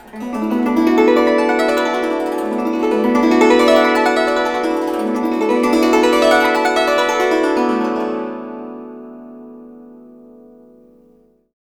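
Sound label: guzheng; Spectrasonics; Heart of Asia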